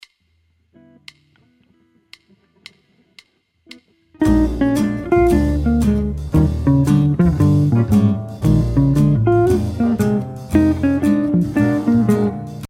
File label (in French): pattern 3 quatre prem mesures blues en bb, guitare jazz